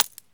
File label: 556mm shell soil; Escape from Tarkov